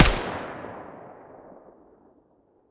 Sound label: muzzle mono, weapons; fort